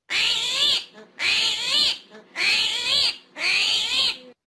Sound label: Armadillo; آرمادیلو